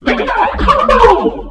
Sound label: combo mega; balloon rain